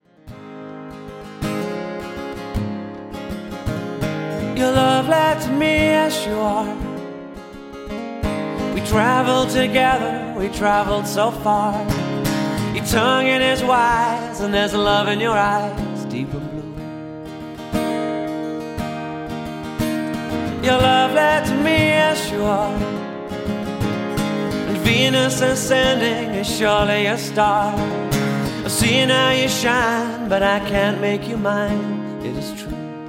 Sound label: vocal